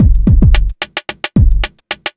block rock kit

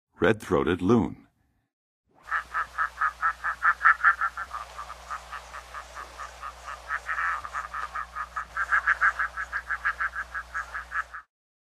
Red-Throated Loon; North America; Western USA; Western USA Songbirds

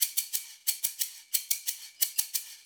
Gold Cylinder Shaker; Rock; Percussion

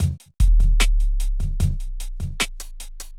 hiphop

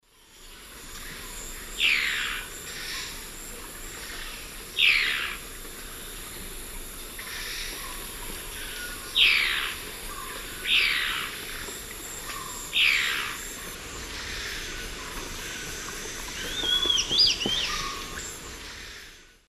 Rhegmatorhina melanosticta, call and subsong; S. America; Eastern Lowlands

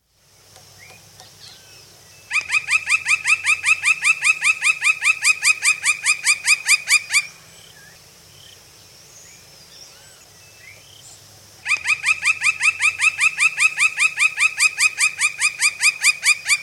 Lineated Woodpecker; S. America

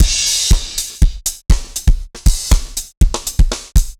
dance floor tight 120, loops

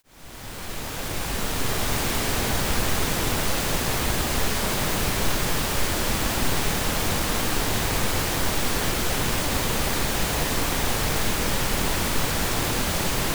Pink noise; Ayre Acoustics, Inc; Ayre System Enhancement Disc

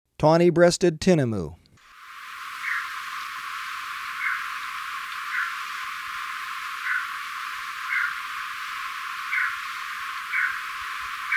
Tawny-Breasted Tinamou; S. America

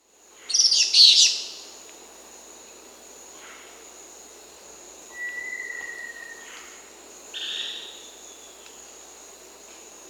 Sulphury Flycatcher (Tyrannopsis sulphurea), Peru; S. America

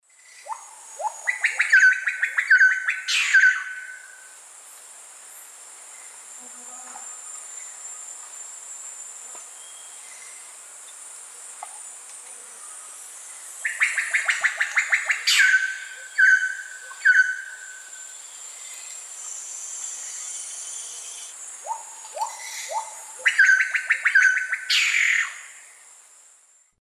Cacicus solitarius, calls or song 2 birds; S. America; Eastern Lowlands

